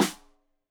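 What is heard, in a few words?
Snare Stereo, Drums; Deeply Sampled Drums; SMDrum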